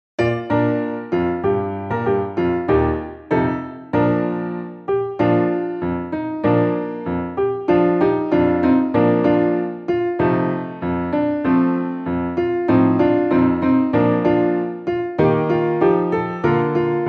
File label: Hello in any language piano